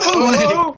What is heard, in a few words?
woooah